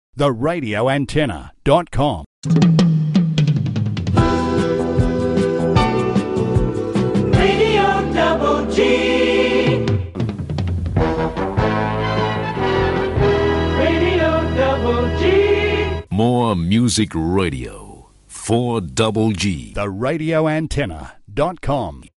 Jingles and ids 70s; Aircheck

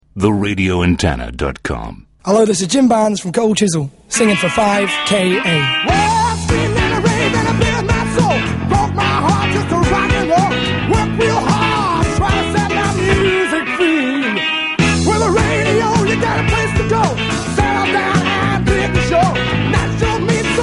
jingle; Aircheck; 5KA; Life Station; 1970s

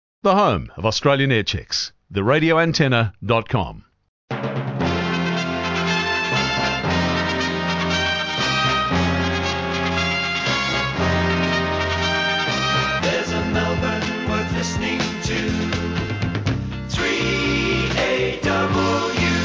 jingle; 3AW

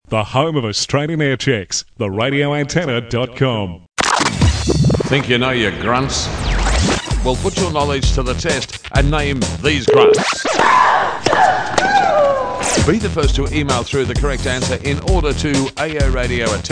secret grunts

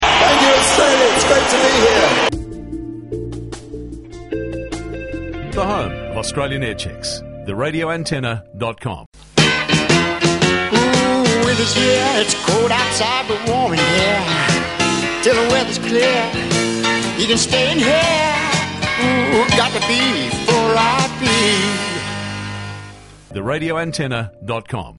jingle; 4IP; winter radio 78

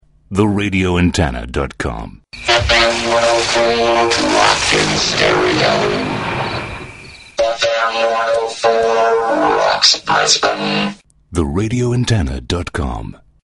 ROCK IN STEREO Vocoders; FM 104

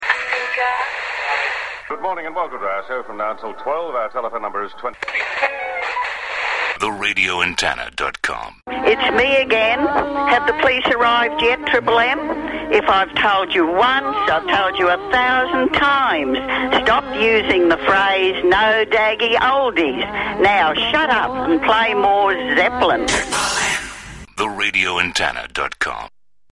sweeper; FM 104 Triple M

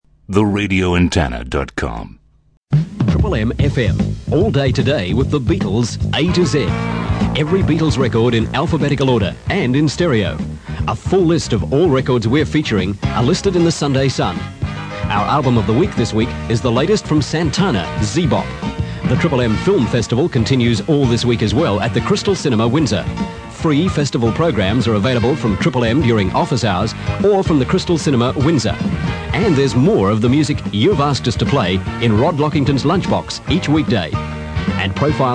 promo and jingle; Aircheck; 4MMM; 1980

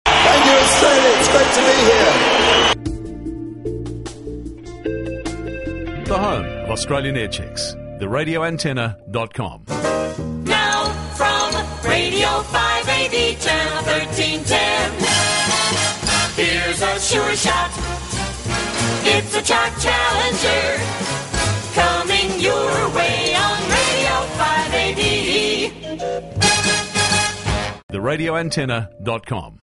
jingle; 5AD; 60s; Radio antenna

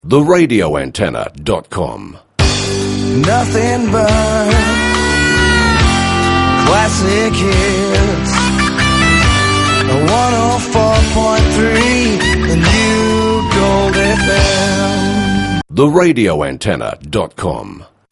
Jingle; Aircheck; 104.3 Gold FM

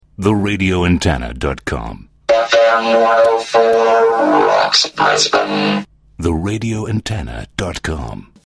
VOCODERS ROCKS; Aircheck; FM 104; Brisbane

